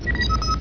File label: Door Chime; HyperBBS; STTNG